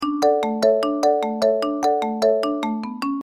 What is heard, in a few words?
Marimba D major fun fair